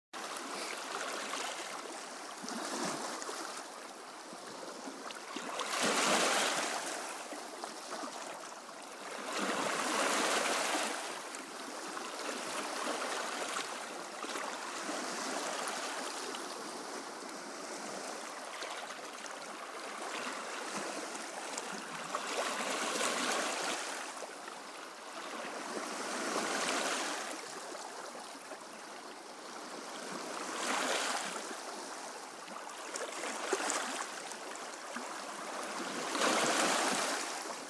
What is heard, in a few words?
Lake Superior at Little Todd; Isle Royal